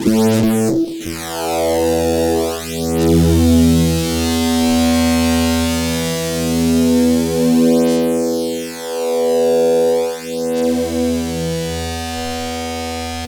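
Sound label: fuzz guitar, Hoax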